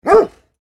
Large dog barking single bark

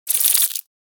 Reptile tongue flicker

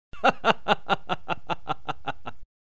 Middle aged male laugh

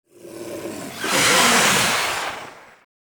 Young dragon roar

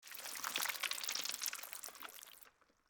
Slimy organic movement wet slithering; slug and worm; squelchy and organic

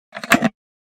Small wooden box container push to close

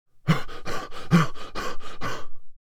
Man rapid breathing

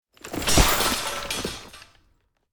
Medieval armor and leather uniform dropping

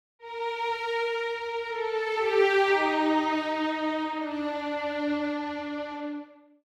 Cartoon sad moment, Cartoon Orchestral

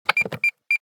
Car interior beep signal notification

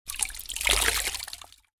Squeezing sponge into water

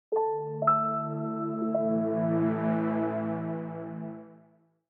Corporate minimal logo intro

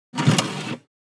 CD or DVD ejecting disc from player

